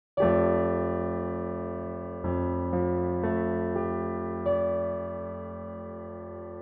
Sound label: 13 edo chord